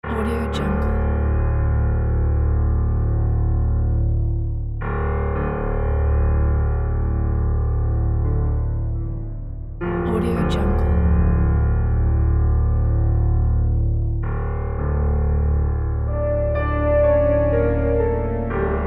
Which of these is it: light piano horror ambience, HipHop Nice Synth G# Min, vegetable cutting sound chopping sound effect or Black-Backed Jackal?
light piano horror ambience